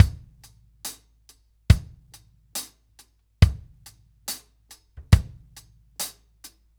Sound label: Ballad Loop, Drum, 70s Ballad; Drum Tools